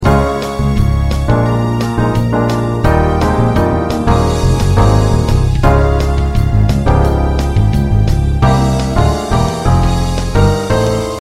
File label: Accompaniment